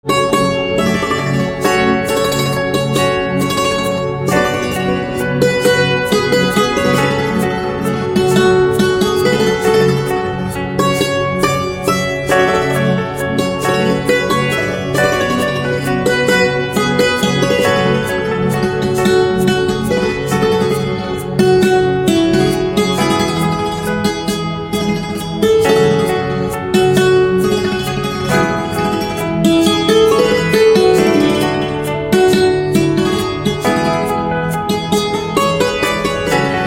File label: Santoor Instrumental Ringtone